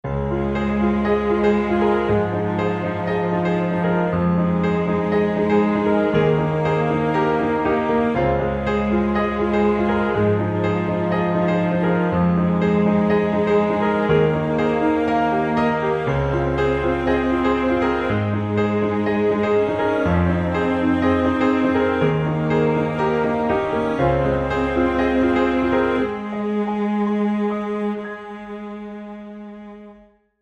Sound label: Piano and Strings